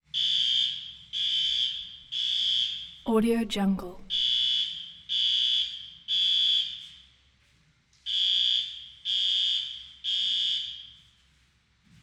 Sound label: Fire Alarm